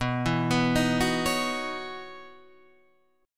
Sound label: C sharp Minor 11th B